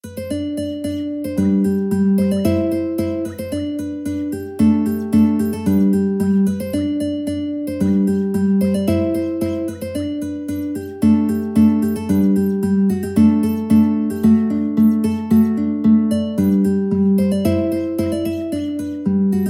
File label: mandolin; Praetorius